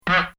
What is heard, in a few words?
Comedy Effect Horn; Funny Sounds; Bobby Cole